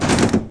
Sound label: bank door open